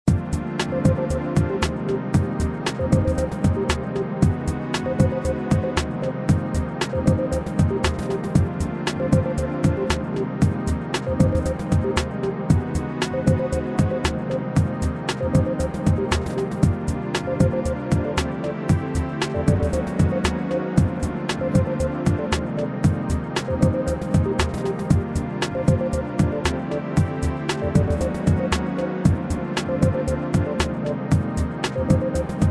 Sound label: music main; flight simulator